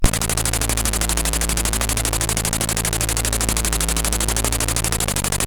Colony, CSG8580

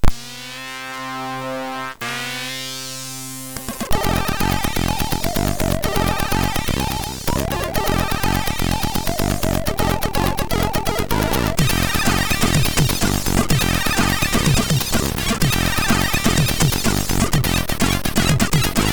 hardcore tekkno, sid MOS6581R3

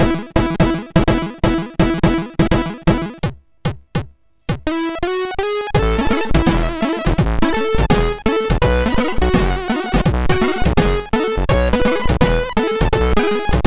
Happy Birthday sid MOS6581R3

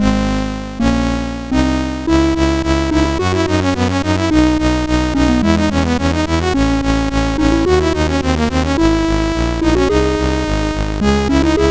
GoatTracker Classical Example sid CSG8580R5

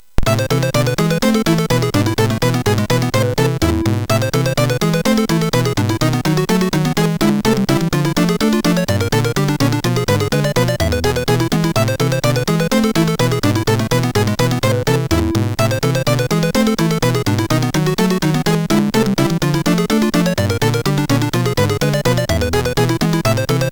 Classical, MOS6581; MOS6581R4

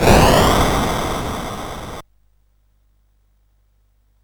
Dark Castle, CSG8580R5

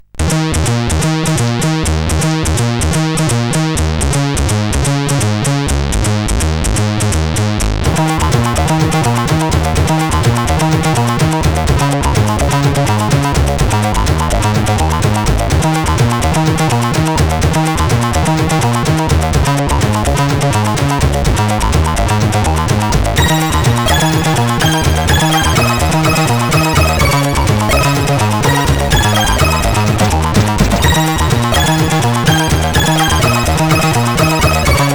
tune, sid 8580R5

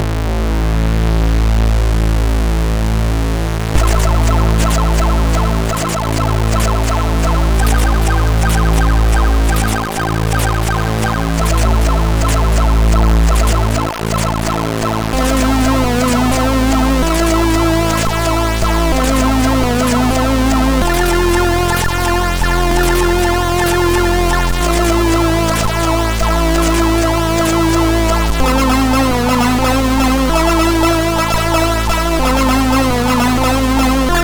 tune, MOS6581R3; Sludging tune 1; hvsc

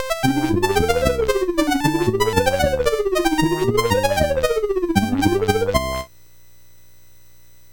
Ducks Ahoy, MOS6581R4

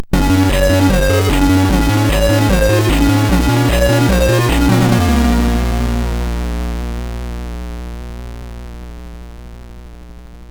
sid MOS6581R4, GAMES; Cloud Kingdoms

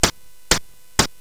Fast Sfx, CSG8580R5 sid

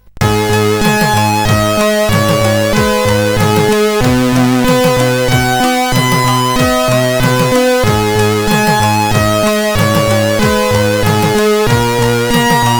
tune, sid, CSG8580R5

